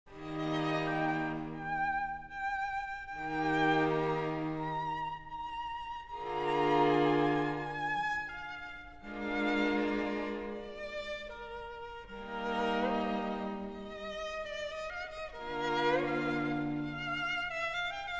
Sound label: String Quartet in B flat; Beethoven